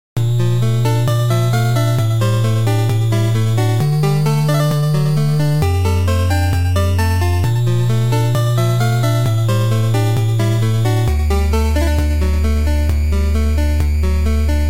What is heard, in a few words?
gameover; Mutant Mudds Deluxe; 3DS; PS Vita; PS3; Wii U